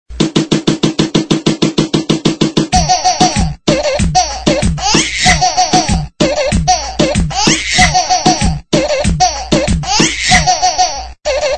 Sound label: Funny Baby Ringtone